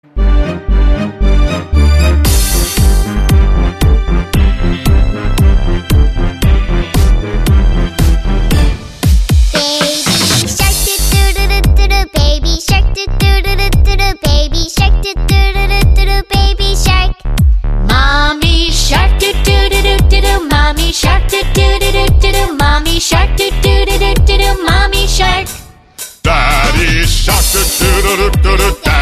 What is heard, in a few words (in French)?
Kick Pull Kick musique; artistic-swimming; TESTS 2023-2024; World Aquatics Figures and Elements